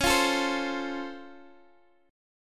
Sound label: C sharp Augmented Major 7th, C sharp, chord; down; chord shape